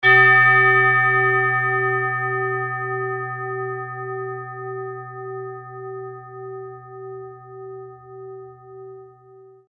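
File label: tibetan bowl sunset, B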